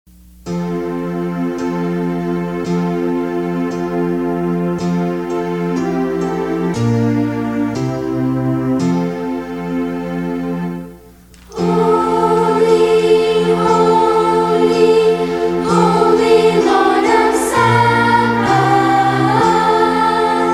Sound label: Holy Holy; ENG